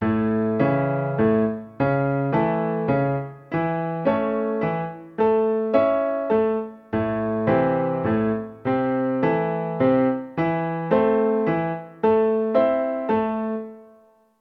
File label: triad and inversion rhythm in A and Am